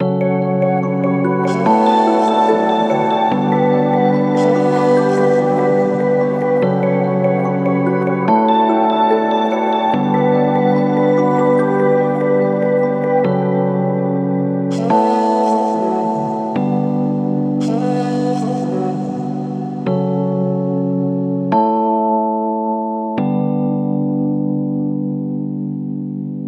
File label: 145BPM